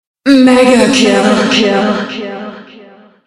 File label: music stinger; killstreak music